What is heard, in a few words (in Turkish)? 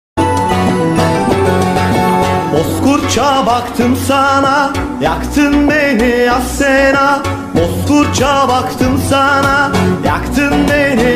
Telefon Zil Sesleri; Bozkurt Zil Sesi